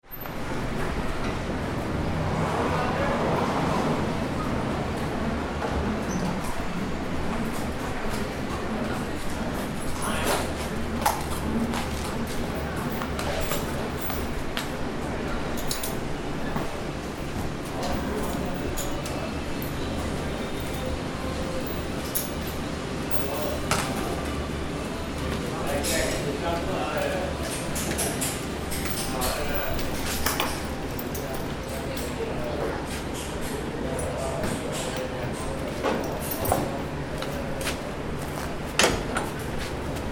Horse Stables Ambience, inside a horse training stable; horses being walked and groomed, blacksmiths shoing horses, sliding stabl; Horse Sound Effects